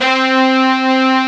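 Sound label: brass section C3; AKAI S-Series CD-ROM Sound Library VOL-8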